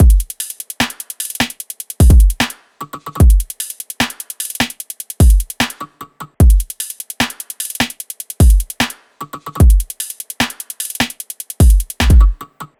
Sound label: loop full 150; Seismic Loops